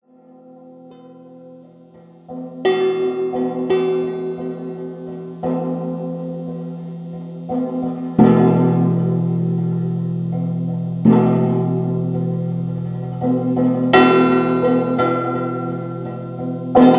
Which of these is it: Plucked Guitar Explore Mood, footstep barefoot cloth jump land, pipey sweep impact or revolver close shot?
Plucked Guitar Explore Mood